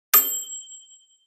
oven bell

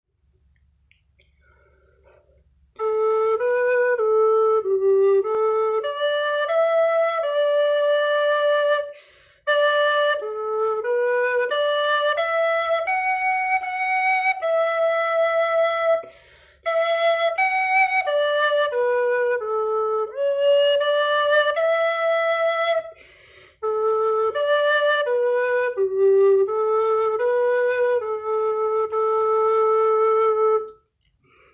Shvi

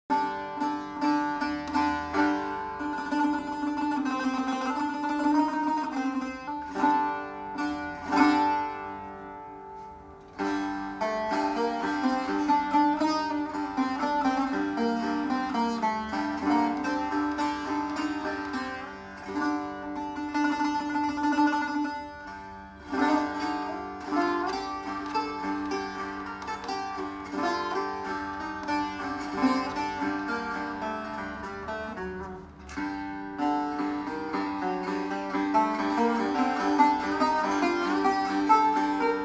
Shurangiz, ساز شورانگیز

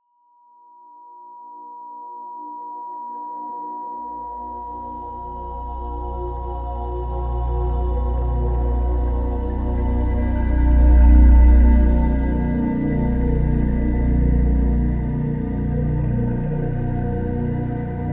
963 Hz frequency

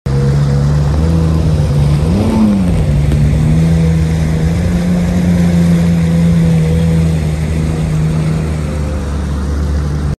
jet ski; جت اسکی

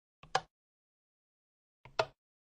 car switch lever

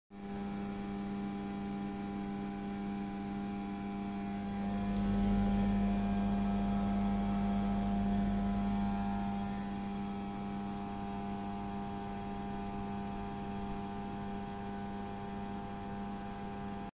Air conditioner

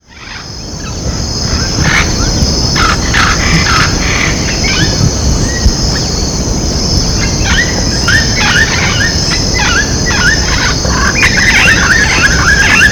Red tailed amazon; پرنده آمازون دم قرمز

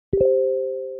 Notification; Telegram

